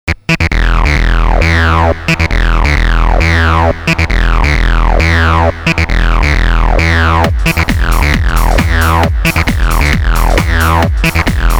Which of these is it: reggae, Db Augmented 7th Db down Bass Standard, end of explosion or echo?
echo